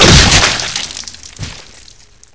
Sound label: pwrench hit bod, chainsaw